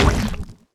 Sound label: explosion slime; Epic Toon FX